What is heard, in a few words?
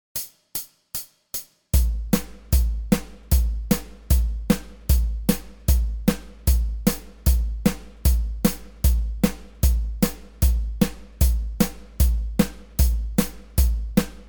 152bpm, bass